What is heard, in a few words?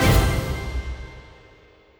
symbol hit scatter